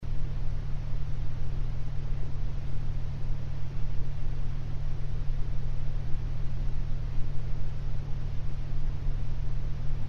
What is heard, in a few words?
pink noise 40hz binaural